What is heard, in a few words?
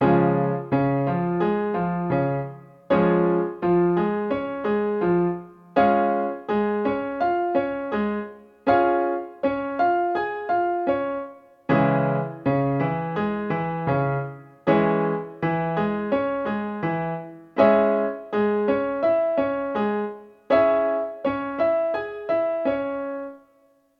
triad and inversion next rhythm in D flat and C sharp m